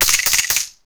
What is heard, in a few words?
two arrow shots or hisses; mmorpg